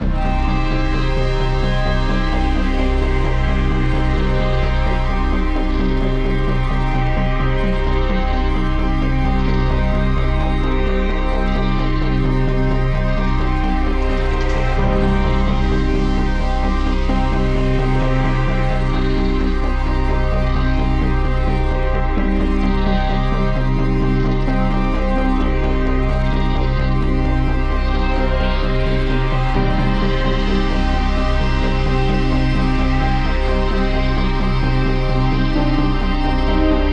Elec Ambient; Electronic Ambient Music Pack

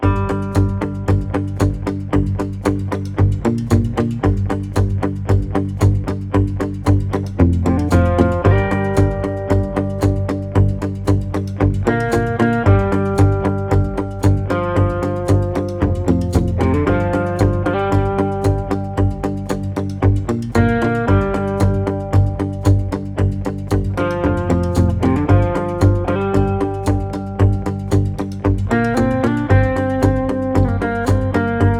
Western Warm Lead; Western Music Pack

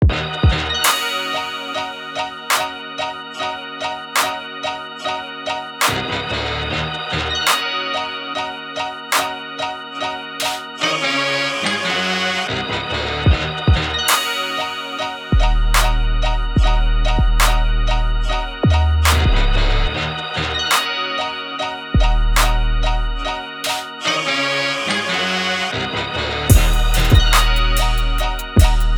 Hip Hop Crate Digger; Hip Hop Music Pack Vol. 3

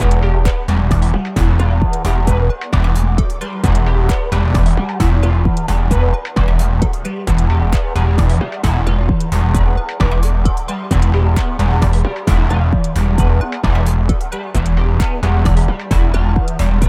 Ambient; Ambient Vol 4 Music Pack

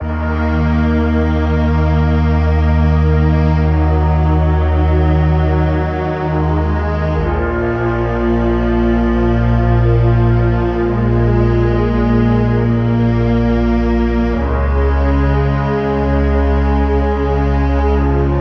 Orchestral Ambient; Orchestral Ambient Music Pack Vol. 2

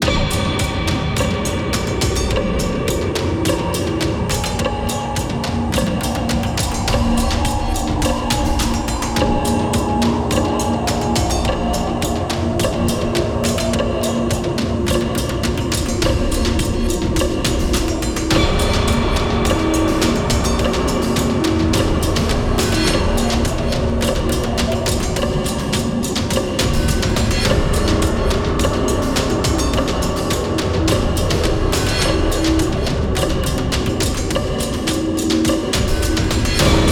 Horror Pulsate; Horror Vol 4 Music Pack

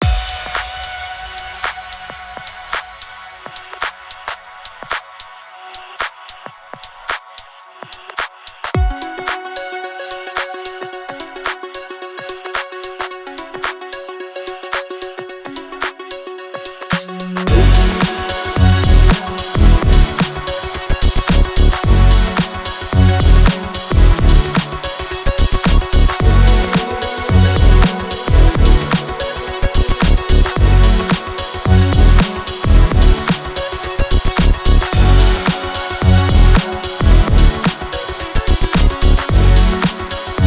Synthpop Funky; Synthpop Music Pack

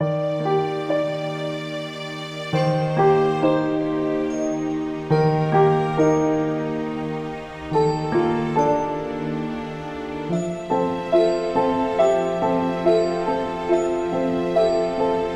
Keys; Keys Music Pack Vol. 2

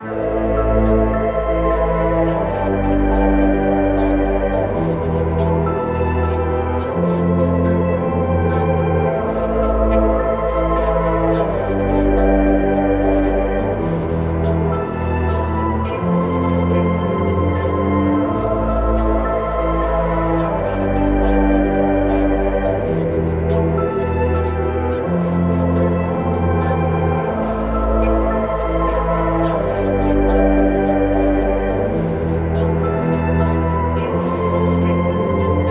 Ambient Hymn; Ambient Vol 4 Music Pack

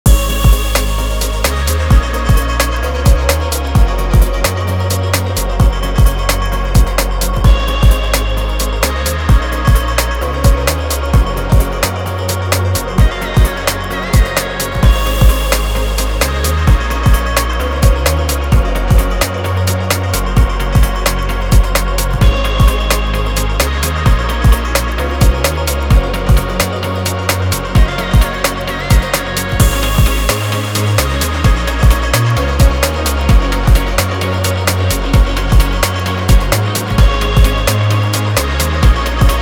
Electronic; Electronic Vol4 Music Pack

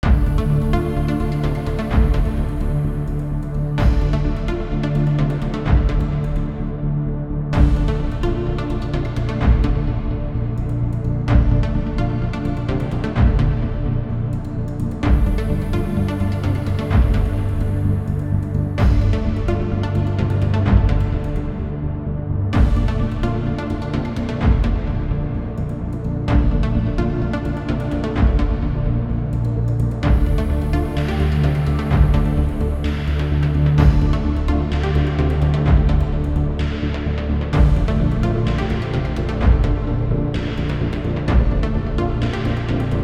Ambient; Ambient Music Pack Vol. 6

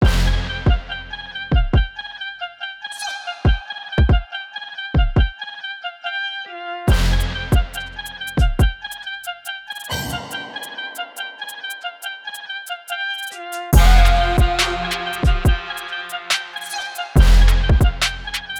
Hip Hop; Hip Hop Music Pack Vol. 3

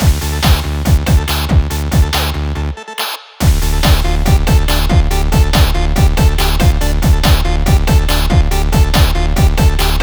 Chiptune; Chiptune Music Pack Vol. 4

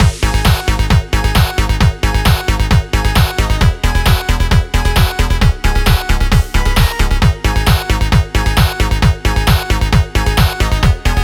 Chiptune; Chiptune Music Pack Vol. 4

